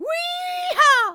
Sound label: "wee", Voices; Trafalgar; Quarry